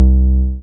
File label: TB SQ C3, Roland TB-303 bass